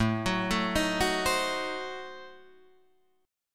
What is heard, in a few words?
A Diminished 7th A down Guitar Drop D